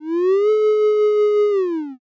dog howl

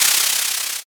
paper tear

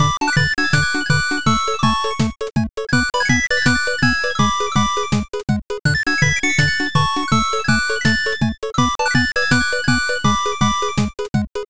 bgm bright

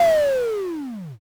power off

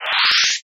fusuma

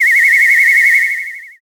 whistle long

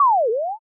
slip

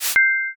sent

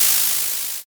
tire skid

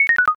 score down

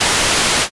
mosaic

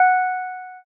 piano

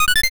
chain

